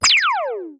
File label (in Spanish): lanzada